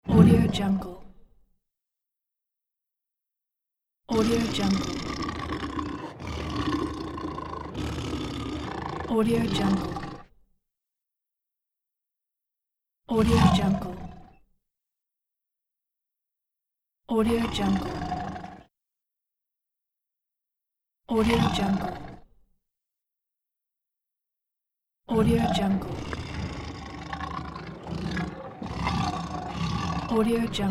tiger roaring pack

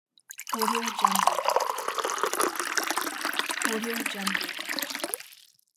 water pouring

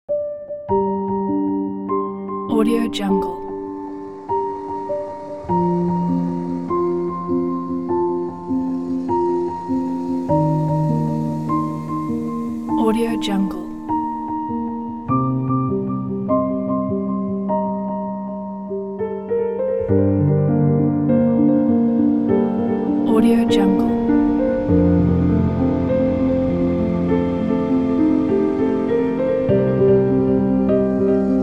sadness cinematic

